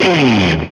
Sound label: GTR GUIT SL, E.Guitar FX